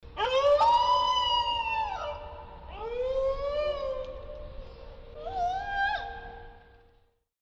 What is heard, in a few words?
dingo